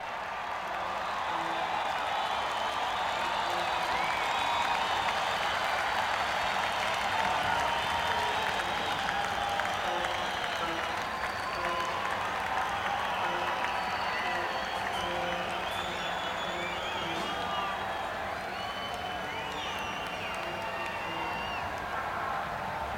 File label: Crowd